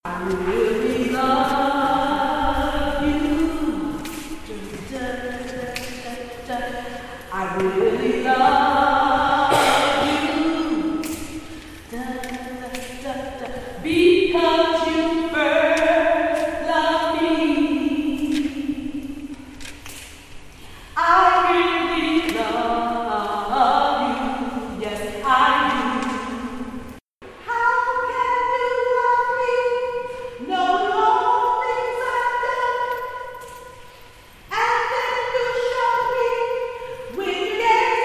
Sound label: ALTO